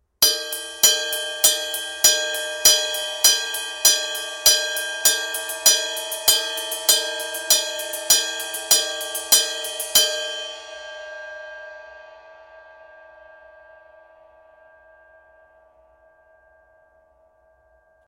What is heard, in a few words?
zildjian